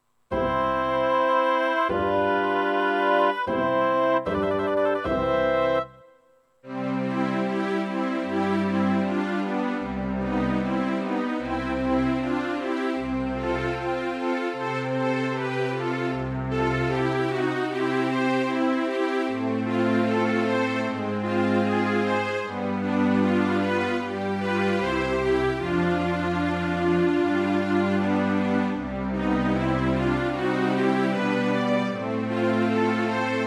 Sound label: andante orchester; フルート用伴奏; mozart; flute